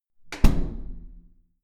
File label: door close